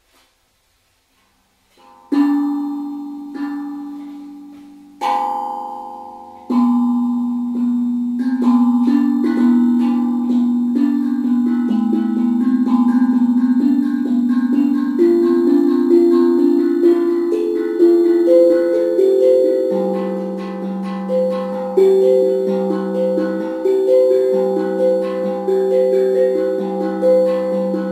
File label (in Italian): improvvisazione